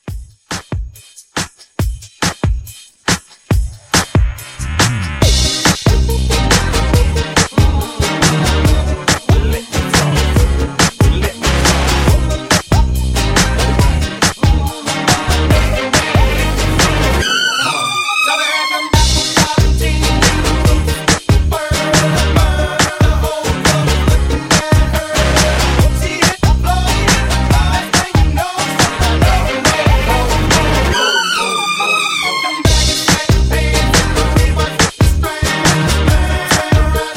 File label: Mashup 140